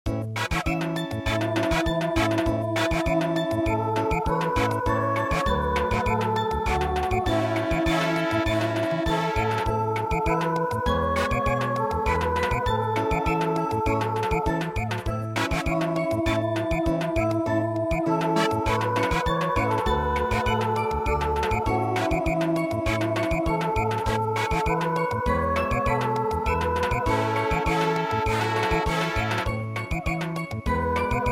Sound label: Samba